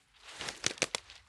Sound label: Trudge Step, outdoor, Footsteps